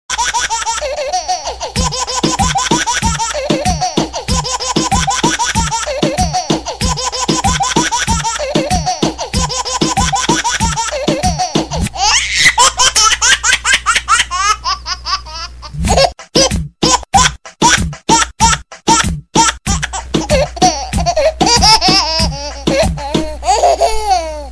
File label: Disco Baby Laugh